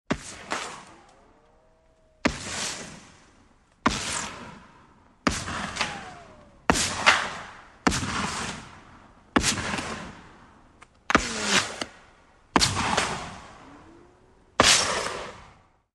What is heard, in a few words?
Rifle Fire Bullet Whi, muzzle loader exterior